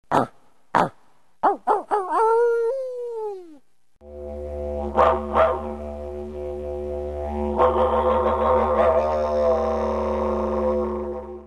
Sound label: dingo, didgeridoo, barking or howling like a dog into the instrument; ark..ark….woof…woof for the barks and ar.. ar.. ar.. aahhooo…for the howl; Timeless Productions; didgeridoo tutorial